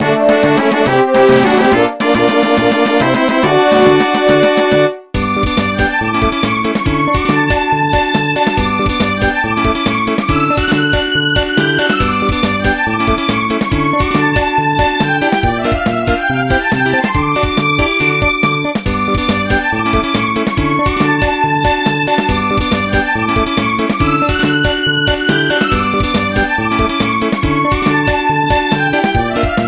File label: Fanfare; Luccas Theme